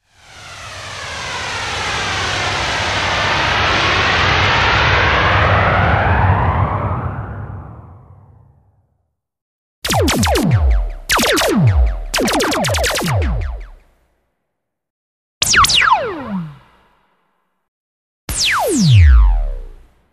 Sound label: jingles radio laser laser sounds fx; Old Various